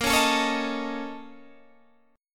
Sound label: A sharp Minor Major 7th Double Flat 5th, A sharp, down, Guitar, Standard; A#mM7bb5 strummed; JGuitar